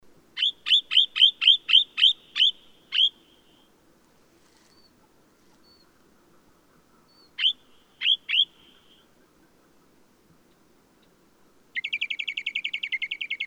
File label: Eastern Rock Nuthatch Sitta tephronota, song, Karatau, Kazakhstan